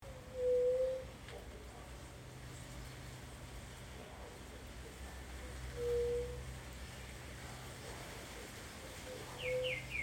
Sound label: white tipped dove